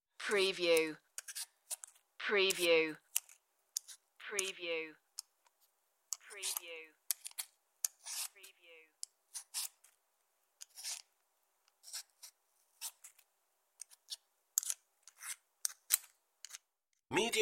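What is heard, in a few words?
Peeling Potatoes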